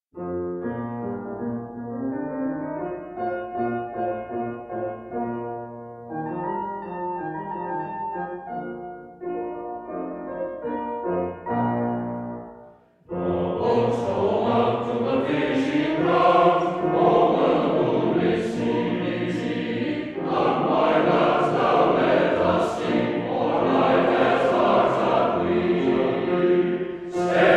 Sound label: Male Voice Choir